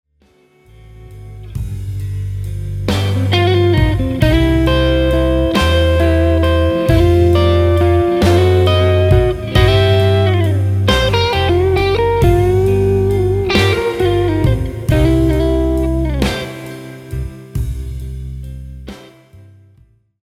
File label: blues turnaround solos